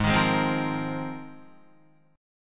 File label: G sharp 6th Add 9th, Guitar; G#6add9; strummed; 4,6,6,5,5,5